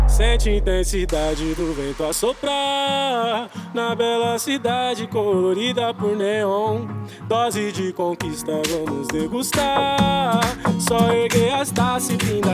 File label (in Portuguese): hip hop mega funk